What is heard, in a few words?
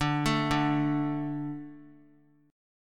D 5th D, down; D5 arpeggiated; JGuitar